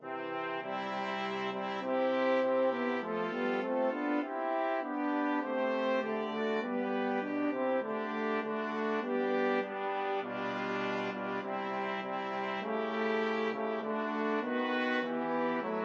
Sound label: BRASS,brass quartet; Navy Hymn